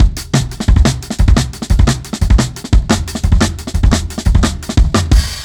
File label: Rough Beat 4 Bar, Drum n Bass; Beats and Percussion; KJ Sawka; Drum n Bass & Beyond